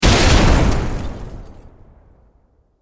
Explosion Automobile Car; SFX Bible 6

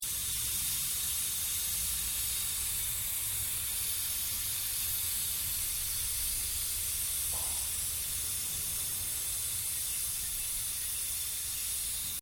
Industrial Machine Unknown Vent Release; Various industrial machines; Machinery (Sound Effects:Machines)

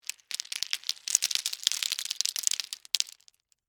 Debris Mixed Nuts, Pile; Texture; Materials; BlastWave

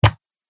Short Sword Pierces Armor; Sword Sound Effects

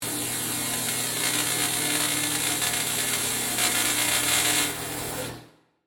Electric Arc, Electricity; Tech

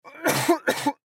Human Cough; Cough Dry Double Element Male 25 Yrs; Human Cough Dry Double Element Male 25 Year Old; Sick; Sound Effects:Human

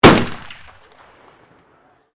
RIFLE 7.62 HUNTING FIRING; Rifles, non military; Guns

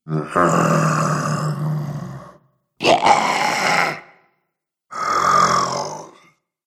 Zombies Selection, Zomby Sound Effects; horror

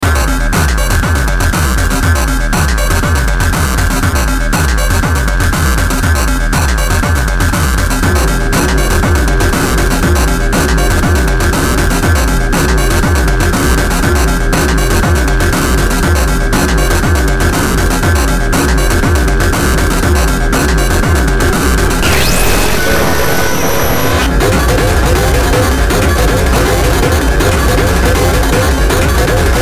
BEAT DIGITA, Beat Digital Distortion Glitch; Miscellaneous Loops; Blastwave

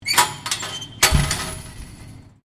GATE SUPERMARKET AISLE GATE OPEN STEREO; Gates (Sound Effects:Doors)

